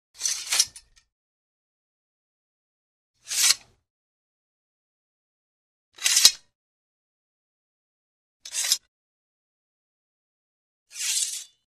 Sword Slide Into Sheath Light Sword Into Sheath; Hollywood Edge Foley Sound Library

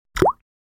Drip Single Falls into Liquid; water

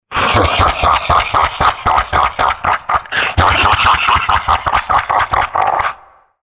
Creepy Laugh; Best Of N2O Library; Workparts With Vocal Highlights

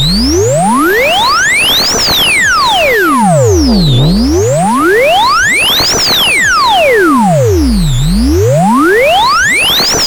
tuning

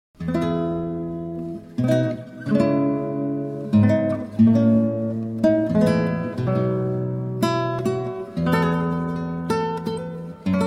Frog Galliard, Guitar; J Dowland

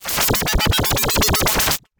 whoosh; Whooshes and sweeps

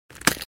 Bone Break

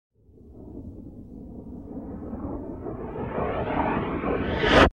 Reverse Stinger - Airplane By; Hollywood Edge Sound Designers Tool Kit

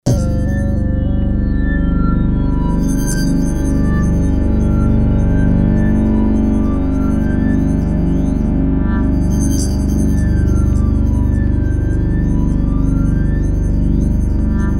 Imperfection Drone; Best Of N2O Library; Drones & Foundations

